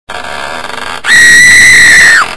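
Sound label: door scream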